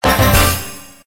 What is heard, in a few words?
WIN SMALL; Ultra Sevens